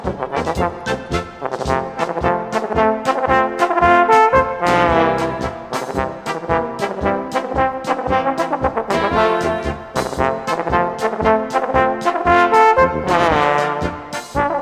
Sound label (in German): Bayrische Polka